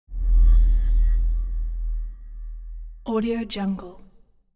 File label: christmas magic notification bling chime; esmteam